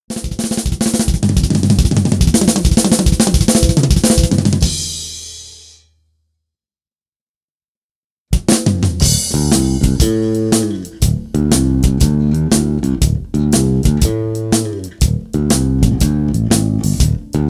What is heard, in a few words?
groove rhytmic acid